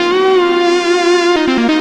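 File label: Lead Fusion, Drum & Bass Keys; Sonic Foundry (Sony Creative Software); Knutrix Drum & Bass; Keys Pads 1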